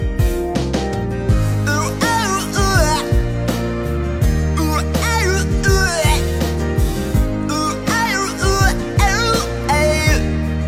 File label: euh ah euh euhhhh